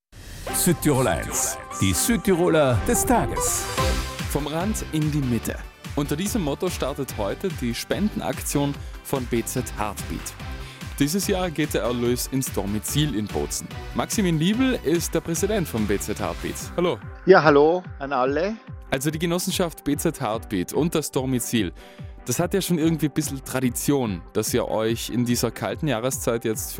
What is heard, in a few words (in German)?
Heartbeat